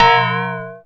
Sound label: A#, Synclav FM PERCUSSION; Big Fish Audio